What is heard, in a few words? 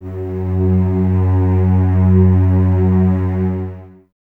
cello F#2, String Ensembles, Cellos, Expressivo; Miroslav Vitous